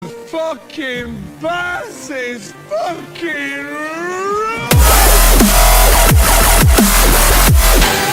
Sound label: bass